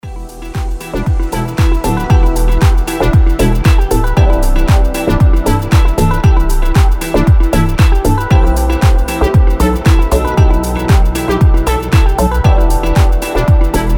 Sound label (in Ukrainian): Просто гарна мелодія